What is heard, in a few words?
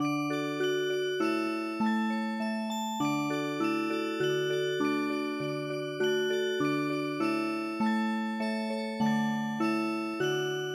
WESTMINSTER CHIMES Music Box